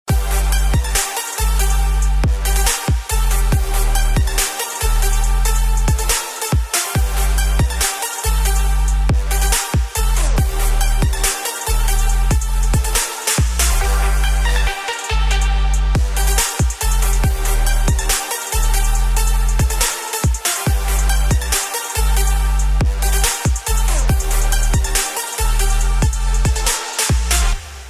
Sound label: Trap & bass mix sound